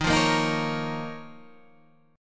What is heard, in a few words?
F 7th Suspended 4th Sharp 5th Eb